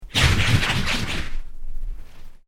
Created Creature Medium Gnash, Alien Sound Effects; Created creatures, vocalisations and pretend speech